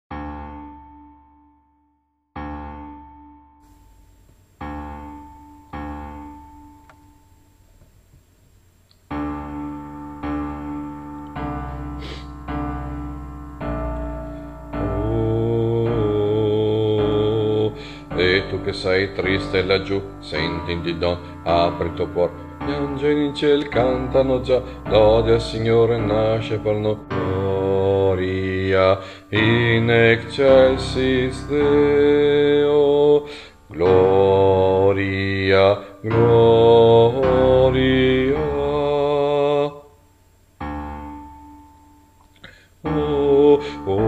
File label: carols bas; Carols of the bells